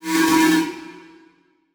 Transition Metallic Drone; Production Element

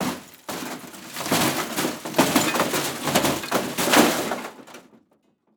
Metal Objects Falling Of A Aluminum Shelf; Crashes

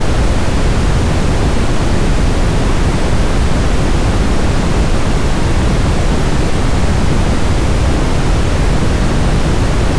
brown noise

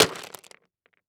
Impact Rocks Hit By Plastic Tube; Impacts

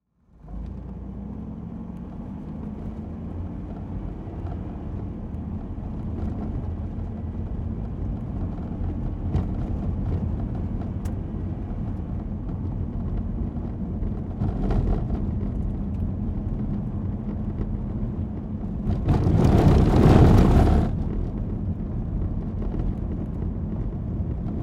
Transportation Car Drive On Bumpy Road

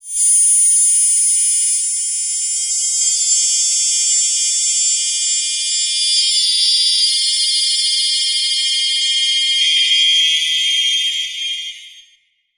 Title Transition Communication Distortion Jammer

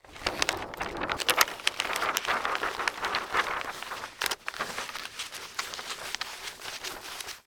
Foley Paper Shuffle And Roll Up

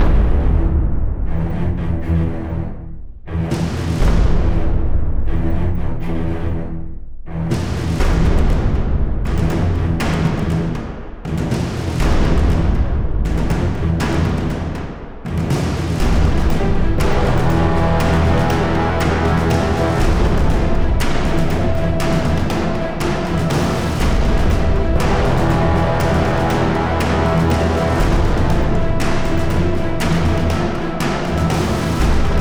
Battlefield Epic 120BPM; Cinematic Trailer Music; BlueFX

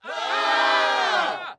Human Crowd Scream Ahh

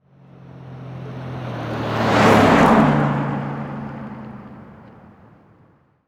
Transportation Car Monte Carlo Drive By Slow

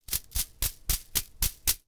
Foley Footstep Human Running Through Brush; Foley Footsteps

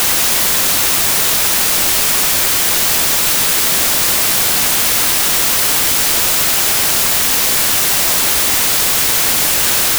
White Noise Mono

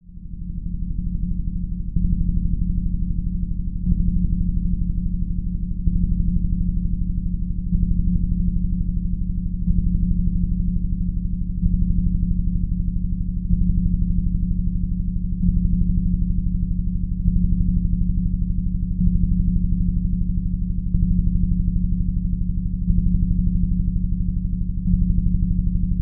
Drone Bed Pulsing Delay; Production Element Imaging; AdobeCC

